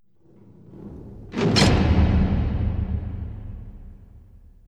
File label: jail door